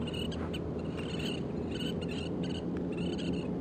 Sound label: Pratincole flock